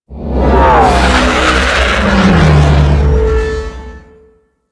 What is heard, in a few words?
Ship Attack Maneuver Generic, Engines; Warframe; Vehicles; RailJack Enemy Ships; Corpus; Maneuvers